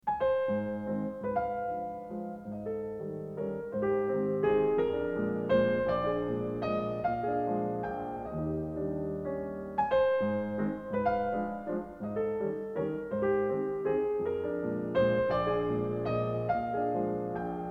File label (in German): Carnaval für Klavier; Wilhelm Kempff; Op 9; Valse allemande